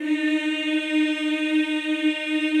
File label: CHO ES3, Eeh; Eeh + Ehh